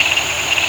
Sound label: NILE, JUNGLE FX; Spectrasonics; Heart of Africa 1